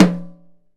Nosnare; Digital Sound Factory Protean Drums

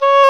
Oboe, Orchestral Winds

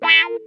WAH, Funk Guitar; Zero G - Funk Guitar

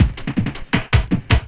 LOOP, 160 BPM, Drum & Bass; Ueberschall; Drum & Bass Resonance

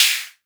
vermona drm1 mk3 hh

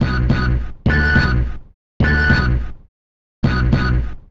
Lo Fi Spy Tones; Sonic Foundry (Sony Creative Software); Stylus Pressure Urban Grooves on Digital Wax

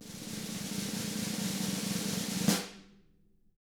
snare roll; ILIO; Double Platinum Drums 1

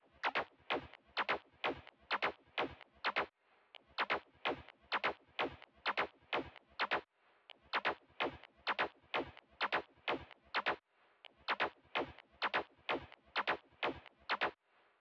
Top Loop, Drum Loop 128BPM; VEC5 Drumloops; VEC5 Multi Loops; Loop Elements

